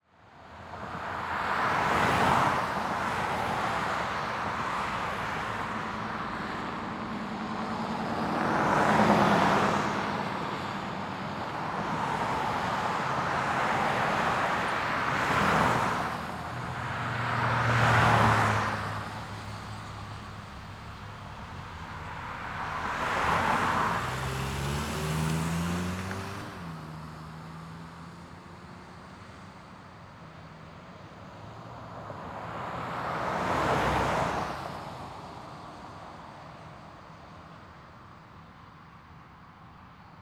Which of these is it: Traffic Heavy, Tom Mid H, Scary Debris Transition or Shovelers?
Traffic Heavy